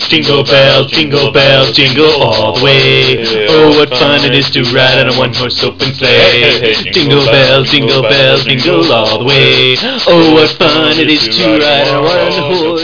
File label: jingle; Jingle Bells